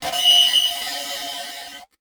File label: Transition Feedback Electronic